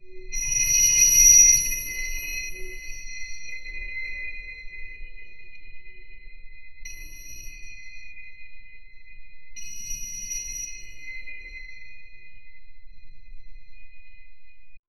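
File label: a soft mystical mechanical, arcane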